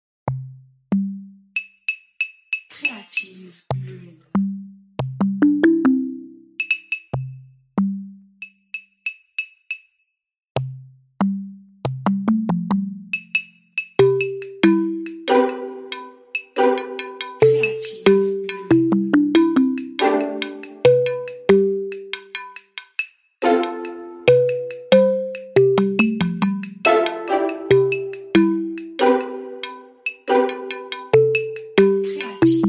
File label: pizzicato sax